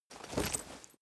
leather metal walk normal single

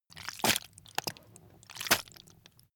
intestines slip

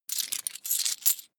coins big clatter fast

